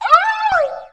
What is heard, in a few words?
doppleganger die